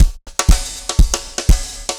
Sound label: dance floor tight 120, loops